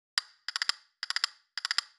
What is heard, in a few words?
castanets